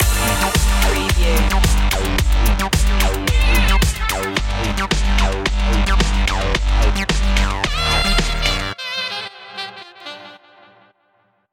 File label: french punk stinger